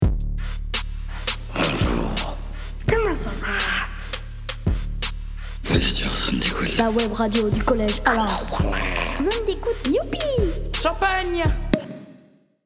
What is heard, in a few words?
jingle